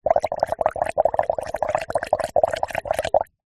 food drink bubbles blow into glass water through straw; zapsplat